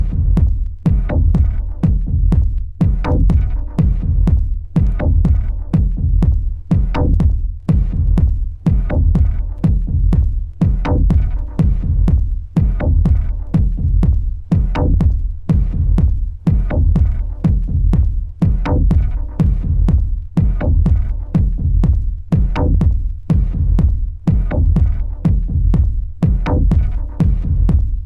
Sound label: BEAT